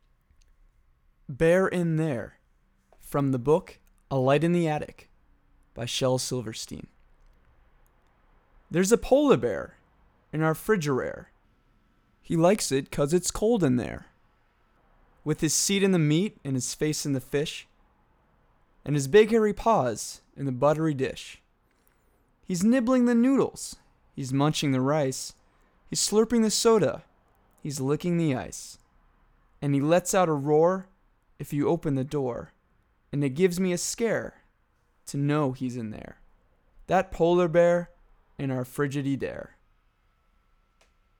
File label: vocals